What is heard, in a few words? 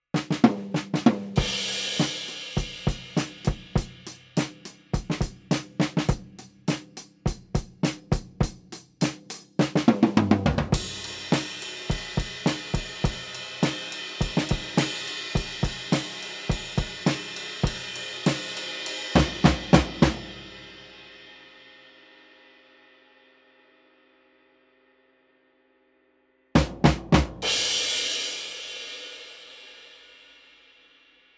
Small Drum Room; Austrian Audio OC818